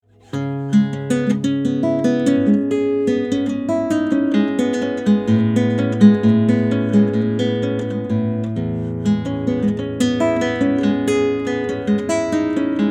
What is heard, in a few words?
STC 4017C Guitar, dynamic microphone